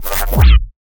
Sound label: Quick Impact; 特效音